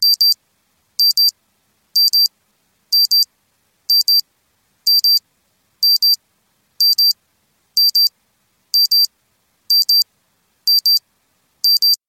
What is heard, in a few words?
watch